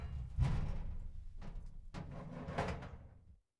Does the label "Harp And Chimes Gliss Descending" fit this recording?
No